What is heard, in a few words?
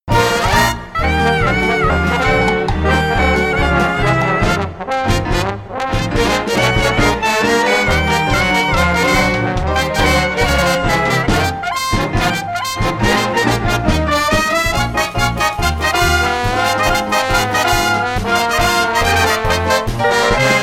dance lower key